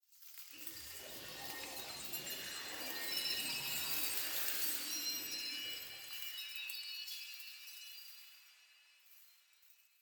Crystal Leaves; starfall

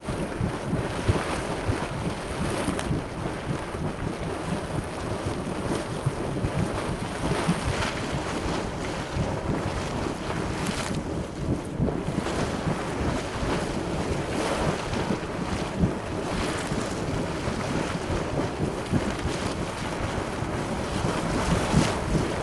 water splash red spouter geyser; ynp

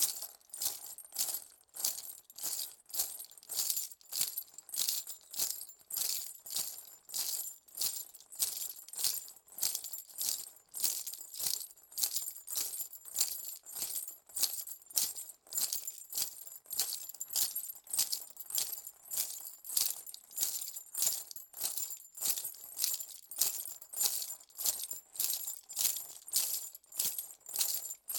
chains scrape; sonniss gdc

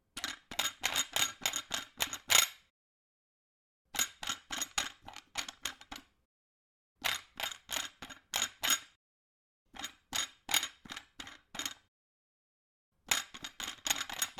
pulling bar threading unscrewing; sonniss gdc

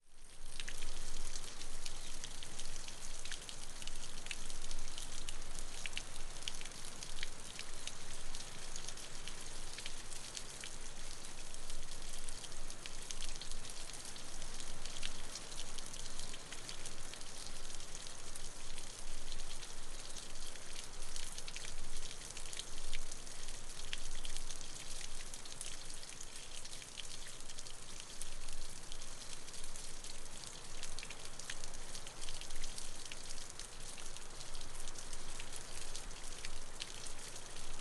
new zealand manawatu gorge stream; sonniss gdc

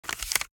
organic paper book page turn short; sonniss gdc

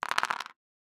medium wooden pieces on cardboard; fvtt sonniss gdc audio

